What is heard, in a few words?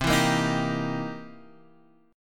C 7th Sharp 9th; strummed; chord; x,x,x,5,4,5,5,4; JGuitar